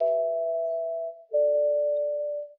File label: flute two notes now, Loops, Jazzmatazz